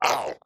strider hurt

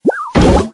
rolling cannon deploy

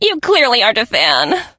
hurt vo

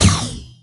Bounce fire

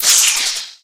dry fire; Byron

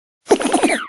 drop bomb

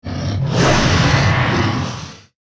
Frost wyrm warcry, 冰霜巨龙; 冰霜巨龙（魔兽争霸3）; 亡灵; Wyrm Breath; 冰龙吐息

